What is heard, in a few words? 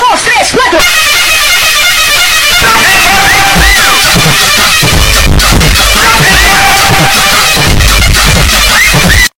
loud music